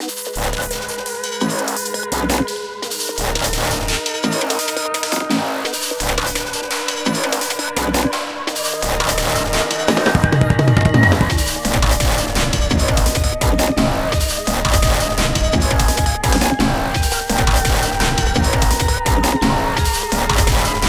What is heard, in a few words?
Night theme all tracks; GGJ20